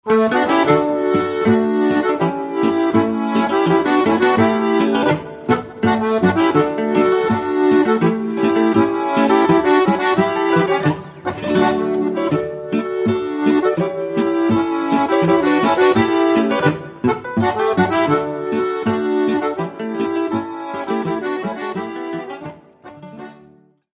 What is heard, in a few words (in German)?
boarischer; studentenboarischer